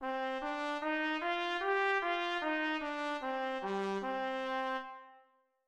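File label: key C minor trumpet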